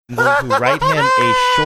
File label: cute laugh